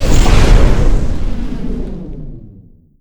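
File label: explosion pipe burst decay; Explosions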